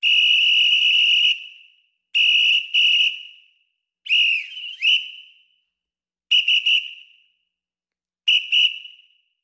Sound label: Traffic Cop Whistle